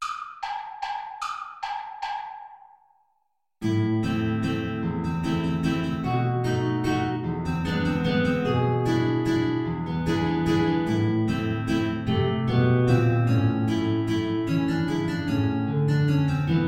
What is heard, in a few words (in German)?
Querflöte & 2 Gitarren; Play-along